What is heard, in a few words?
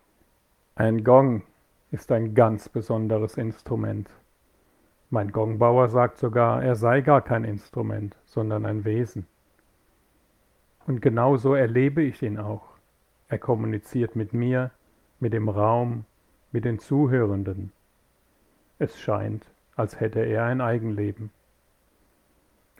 gong